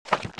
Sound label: close; LG KU3800 Bubble Pop